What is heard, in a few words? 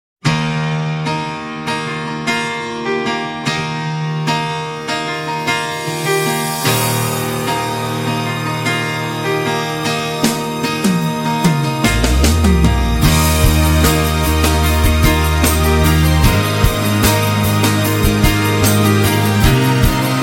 Performance Track In Key Of Eb; Premiere Performance Plus